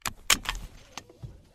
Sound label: taking off belt; deathmatch